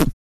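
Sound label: vox percussion, Vocal Planet Jazz FX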